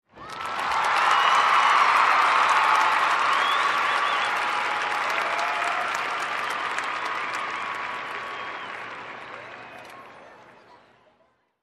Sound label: Crowd in an open field performance cheering